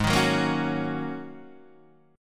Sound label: Ab 9th Suspended 4th Ab down Guitar Open D